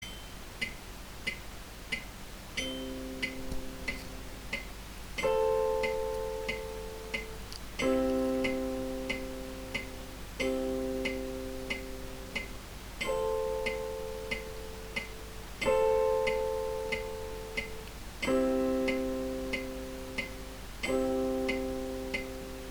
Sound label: blues 12 bar